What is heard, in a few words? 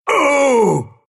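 pain, Russian; dota2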